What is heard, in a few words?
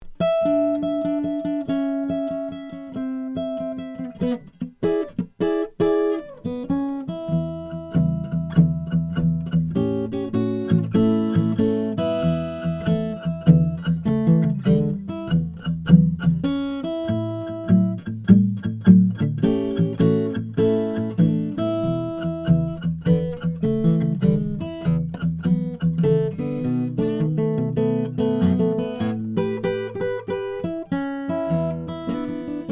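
mcpherson travel cam, guitars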